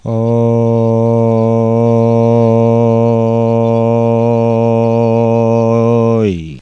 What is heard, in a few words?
ohhhi